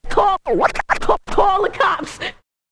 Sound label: scratch short, Vinyl scratches; Masterbits; Vinyl Attack; scratches mix